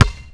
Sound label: bouncy basketball hit; bouncy objects; Tony Hawk's Project 8; XBOX